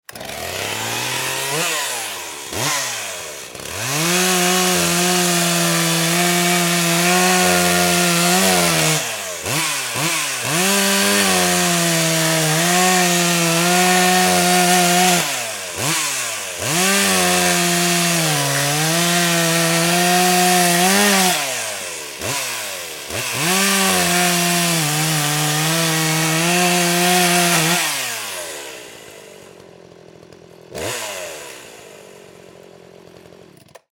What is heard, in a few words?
chainsaw sound